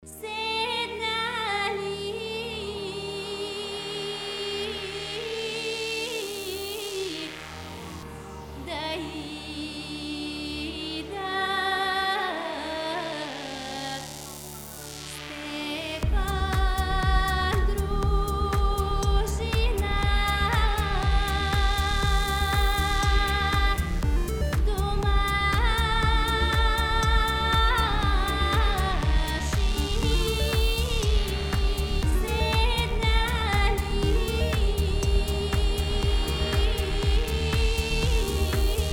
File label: Indian Moodz